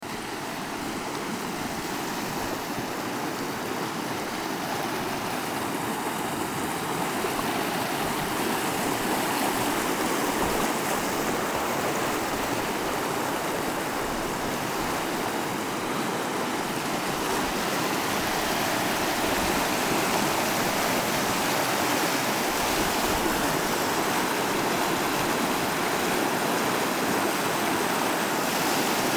Sea And Wave Noise

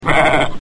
Goat

